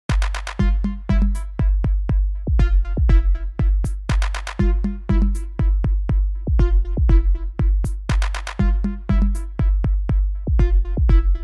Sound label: MIDI ditty